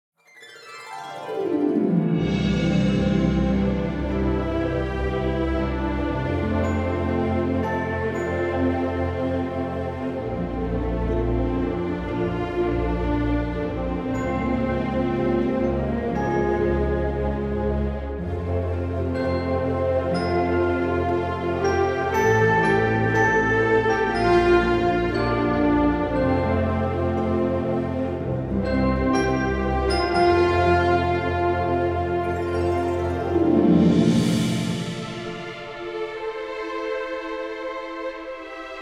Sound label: Orchestra